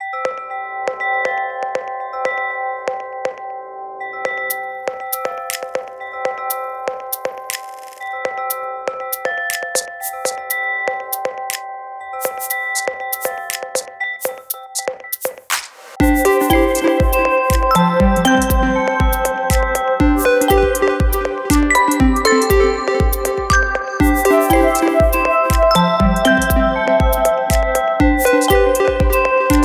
click clap cloud, ringtones; Nextbit; Robin

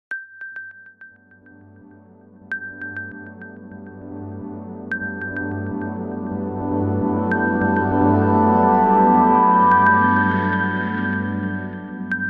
Clock, Radar; Nokia; Nokia Tones; Windows Phone apps

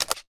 shutter close; Samsung; Galaxy S8; SamsungCamera7

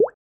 Drop, notifications; OnePlus; dynamic

